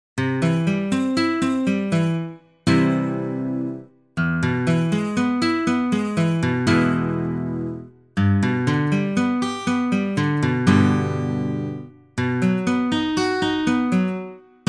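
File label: guitar chords all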